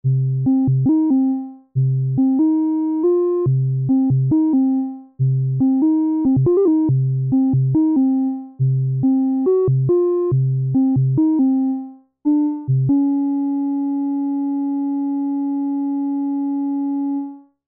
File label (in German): Mini Brute Keys Nachbau erfolglos